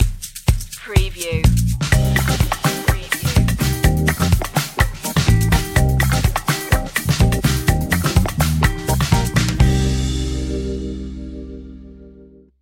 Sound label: african party stinger, percussions